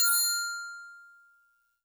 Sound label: Tubular Bells DX100 F6